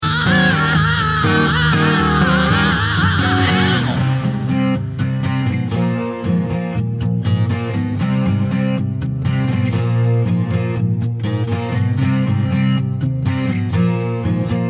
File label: acoustic backing track; acoustic contest